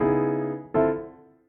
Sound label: Jazzy, notifications; Samsung; Galaxy J3 Prime